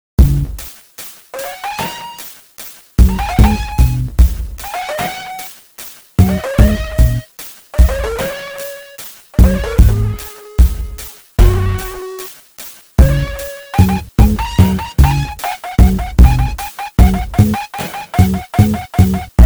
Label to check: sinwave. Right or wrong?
Right